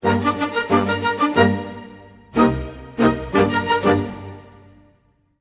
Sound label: 90 g min 2 bars full mix, Orchestral arrangements; PowerFX - Downtown Orchestra